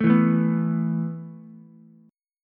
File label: B 7th Suspended 4th B down Ukulele; B7sus4 strummed; chord shape; JGuitar